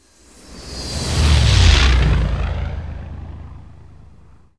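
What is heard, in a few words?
shp flyby, ship; millenium falcon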